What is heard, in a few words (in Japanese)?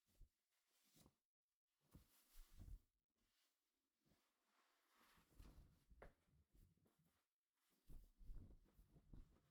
シャツを脱ぐ; KU100; 色々な動作